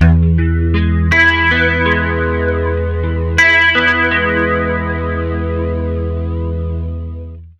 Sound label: 80 MAJ ARP E, Acoustic Guitar Loops; USB Soundscan vol.04 - Electric & Acoustic Guitar Loops; AKAI